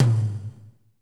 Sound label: TOM, Jazz; Northstar - Drumscapes Roland